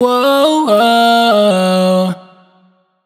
riff Woah Run Tuned F# wet, Vocal Sample Pack, Raw Vocals, Wet Vocals; Slate Digital Omnivox Vocal Sample Pack